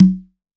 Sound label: CNG L OP